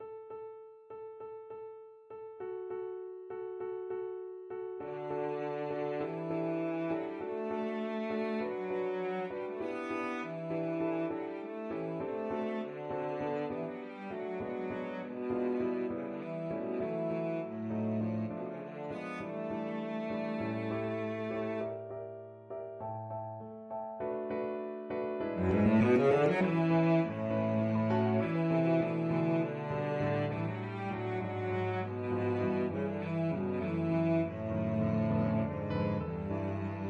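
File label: violoncello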